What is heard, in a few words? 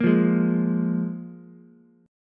F Minor Double Flat 5th, F, Ukulele; Fmbb5 strummed; 3,5,4,x